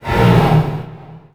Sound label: biomech howl